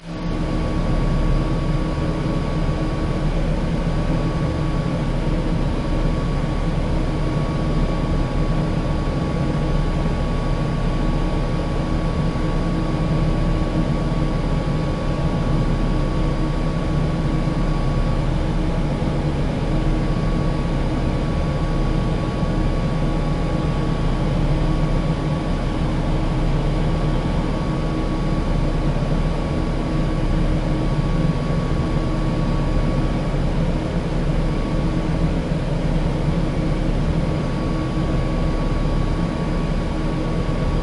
ventilador

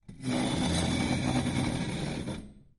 silla

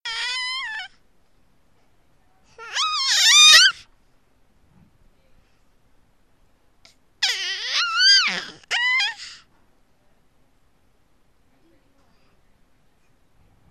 bebe

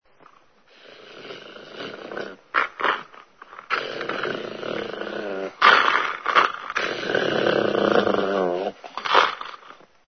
Perro rabioso perro enfadado

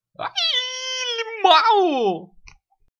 ayy ayy

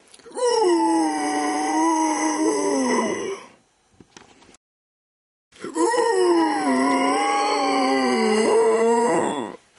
casa

